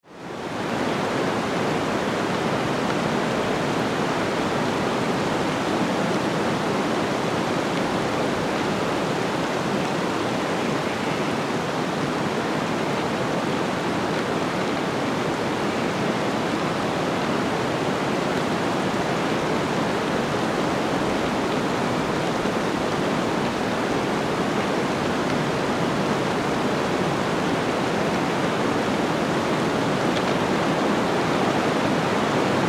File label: Blizzard, Forest, Ambience, Trees, Creak, Wind; 5.1